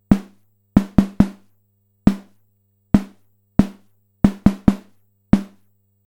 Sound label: snare